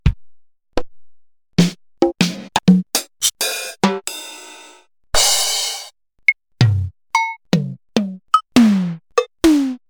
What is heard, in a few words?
yamaha dsr 2000 samples drumkit